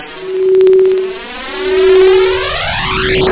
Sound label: glass hi, whoosh; da capo vol1